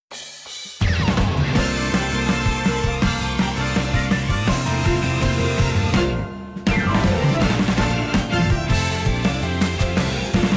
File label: inst